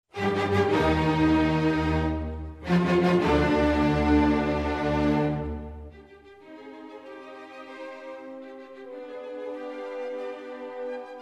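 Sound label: youth South German philharmonic orchestra; eslingena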